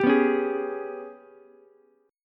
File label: Ab Minor Major 7th Double Flat 5th Ab, Ukulele; strummed; 12,11,9,11; JGuitar